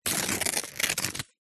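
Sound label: household instructions pamphlet glossy paper rip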